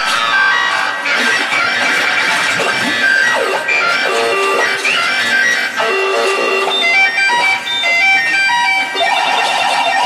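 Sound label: Analog Sequencer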